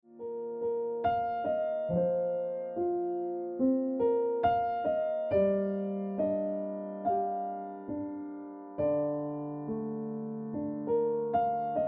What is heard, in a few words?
Piano Music